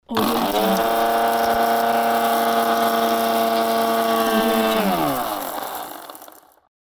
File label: motor; soundforces